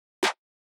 Drum Loop - 136 BPM Snare; Loop Stems; Cymatics; Vibes II Drum Loop Collection; Zenith

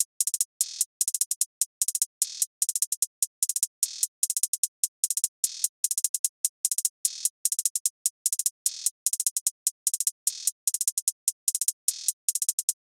Drum Loop - 149 BPM Hihat; Loop Stems; Cymatics; DOPE DRUMS VOL. 1; Promise

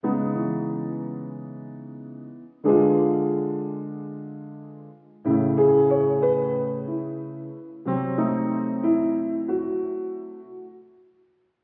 92 BPM C# Min Piano; Loop Stems; Cymatics; Ballad

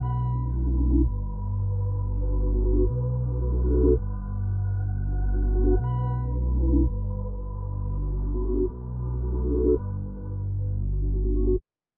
166 BPM B Min Reverse Low; Melodies; Loop Stems; Cymatics; 2022 Melody Mystery Expansion; Midnight In Seoul

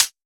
Close Hi Hat, Dubstep Cymbals; One-Shots; Drums; Lenticular; Ghosthack; Ghosthack - AC2024 - Day 13 - Dubstep Sounds 2025

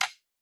Foley (Speaker)

